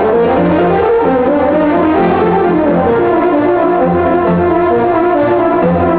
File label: frhorn, instruments